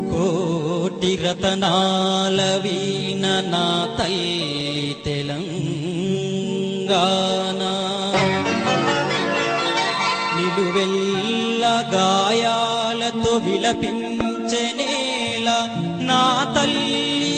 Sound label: veena